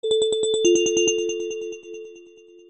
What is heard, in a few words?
Vivo iQOO Neo8 Notification